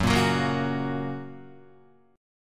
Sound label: Gb Major, Guitar; Gb strummed; JGuitar